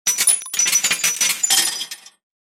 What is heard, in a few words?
12mm shells drop single